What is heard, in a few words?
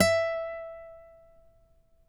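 nylon, FLAMENCO, Guitars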